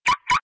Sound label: Carlock Sms